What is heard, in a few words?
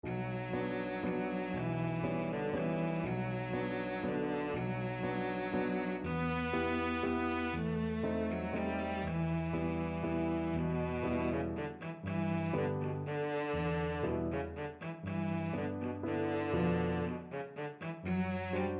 waltz, cello